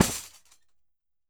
Roland MV8800 percussion

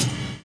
live kick; Univers Sons - Kitch 70s

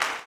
CLAP, Blues Soul; Spectrasonics Vocal Planet

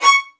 C#6, LOUD VIOLINS; Q Up Arts - Denny Jaeger Master Violin Library

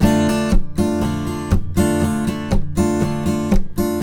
Blues Guitar RIFF 120; ILIO; Fretworks

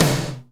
TOM, Drum Dry; East Collexion; Drum 1

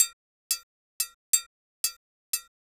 Cajun Triangle

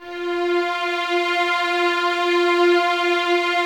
F 4, 11 Violins, Soft; Miroslav Vitous

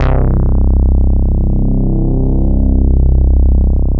Bassnote, Prototechno; Sonic Foundry (Sony Creative Software); Basses

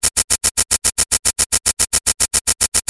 110 PERC, PERC LOOP, Fresh Disco House; USB Soundscan vol.20 - Fresh Disco House I

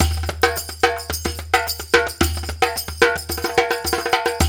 108 DUM, Dumbek Belly; Spectrasonics; Supreme Beats; African Contemporary

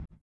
collision; Spectrasonics; Metamorphosis

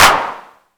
CLP, Korg EMX-1, Drum Machines

